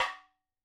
madalam notes L; Sample Banks; Percussion; MADHALAM

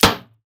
Perc; Sample Banks; Percussion

